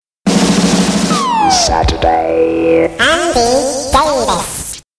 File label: Jingles